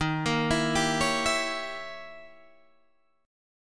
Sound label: Eb 7th Flat 9th Eb, Guitar Open D